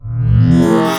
scifi reveal mono; ichni; Wwise

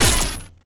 magic cast lightning; MagicArsenal

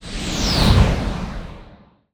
Mecha whoosh; Cielonos

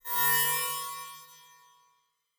UI Alert; Cielonos; Wwise

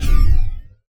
magic cast generic; MagicArsenal

